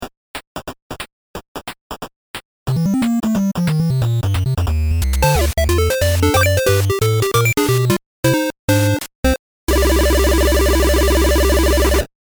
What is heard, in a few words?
magical 8bit Plug Sample